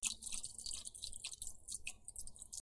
Filling Gas

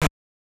Blocking; Mike Tyson's Punch-Out!!

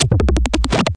hard downwards; Gonner

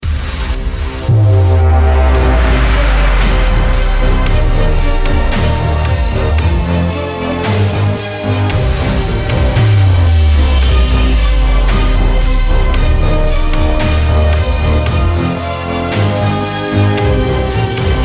Main Menu Music

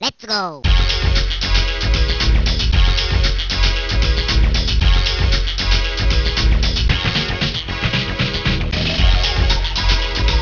Solo; Oh My God arcade gamerip 1993

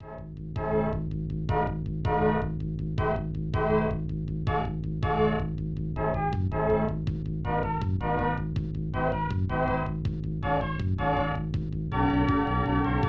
Menu; Super Scope 6; LazerBlazer

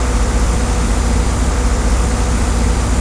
generator waterpump; adams venture origins

